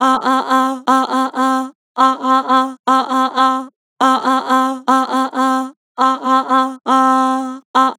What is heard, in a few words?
Vibrato "Aah", Vocals; Harmor Vocals